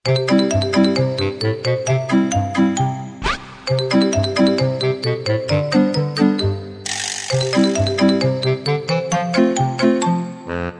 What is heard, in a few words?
Ingame Music; Junior Classic Games 3D